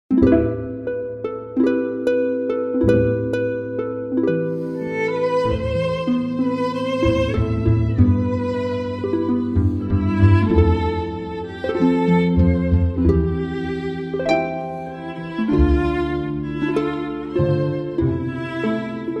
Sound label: cover violin reference